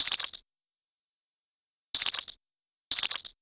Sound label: snake normal long; stroganov